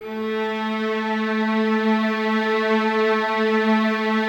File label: violas A 3, String Ensembles; Miroslav Vitous; VLS 1+2